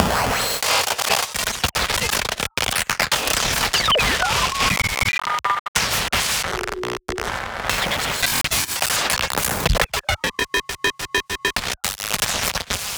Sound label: Glitch Sound Fx; Antidote